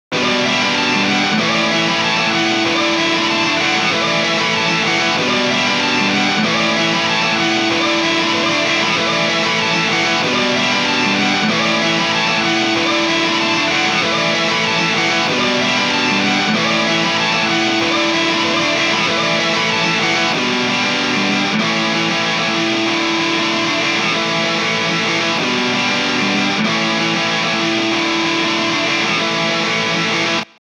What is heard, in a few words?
Guitar